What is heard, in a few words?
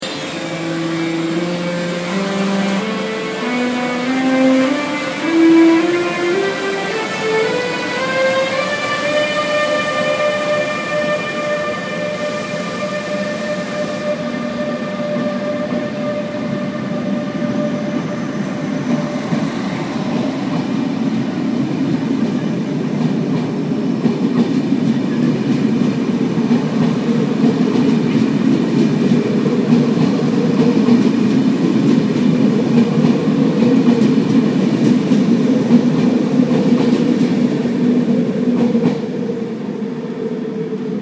Railjet